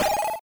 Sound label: SFX Armor Plus